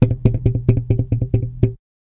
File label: Bass FX G, Bass Guitar Licks FX